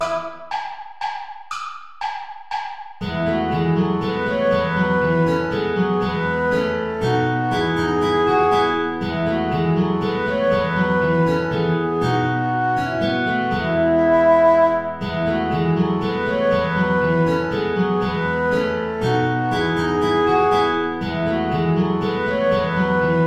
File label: saltarello play along without guitar; praetorius michael